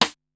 Sidestick, Korg Wavestation-SR, Drum Kit; Wave 455 - Drum Kit

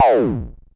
Perc Synth Raw

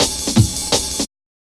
Harsh Cut, Drum Loops 166.5; Zero-G Jungle Warfare 1, 2 & 3

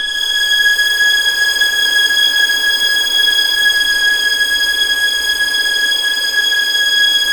G#6, String Collection; East West; The Ultimate String Collection

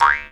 D JAW, Primitive Instruments

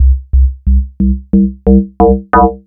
bass basic fm donks kit; Polyend; Recue

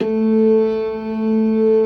ORGAN LOWER MANUAL; Keith Emerson Signature Series

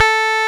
Roxichord, E.Piano

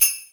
Sl Bell; Percussion

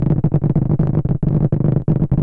FX, Drum Machines; Fricke Schlagzwerg

